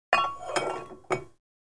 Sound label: Taking off a plate of a barbell 10 pound weight